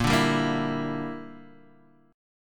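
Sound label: A sharp 6th Flat 5th chord, Guitar, Half Step Down tuning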